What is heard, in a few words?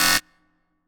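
Rave Synth, Dance Mega Jungle Rave; Best Service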